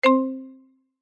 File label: multimedia game sound slot machine single mallet chime